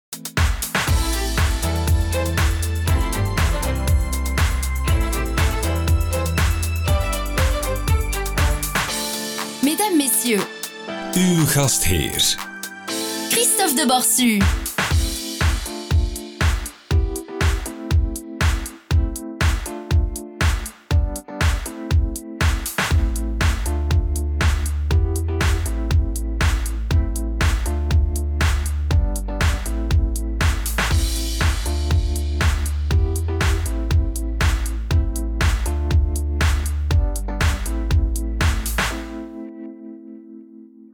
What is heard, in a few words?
event jingle; vlfr larcier group; studio sonart